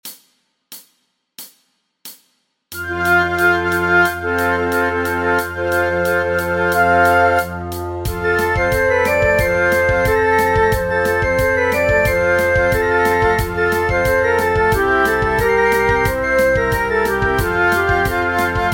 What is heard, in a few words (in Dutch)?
voor oefentracks alle partijen